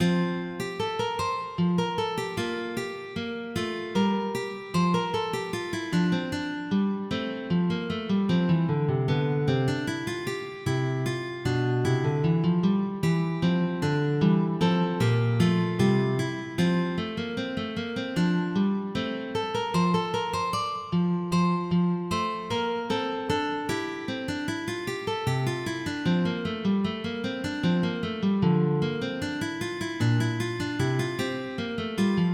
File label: Fantasia; di Milanese